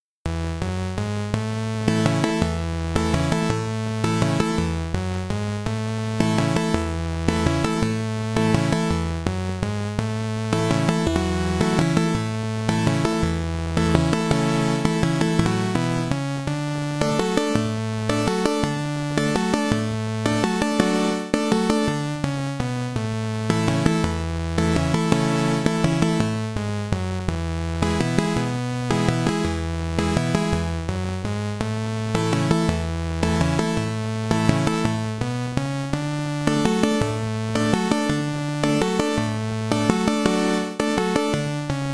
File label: 8-bit